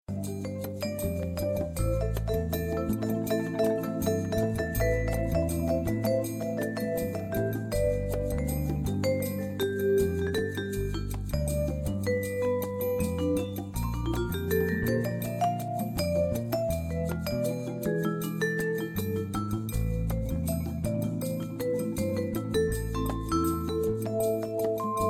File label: Instrumental